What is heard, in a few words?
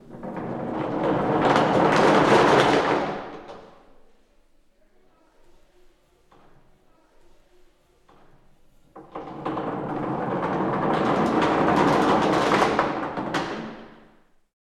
thunder